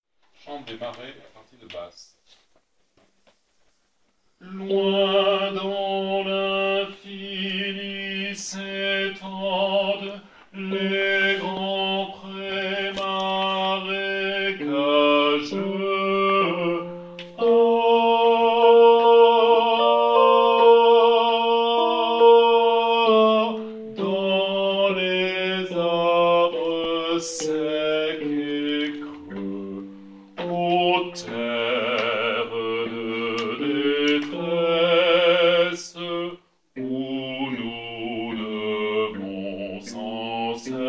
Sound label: Basses; Chant des Marais